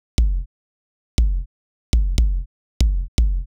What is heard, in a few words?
Kick Low; hydrogen